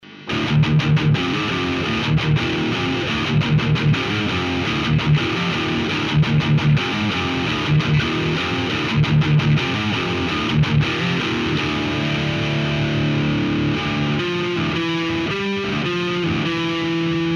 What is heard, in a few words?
Line6 distortion